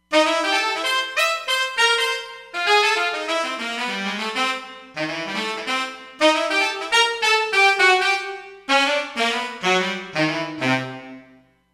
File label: Sax Heavy, Roland Fantom XR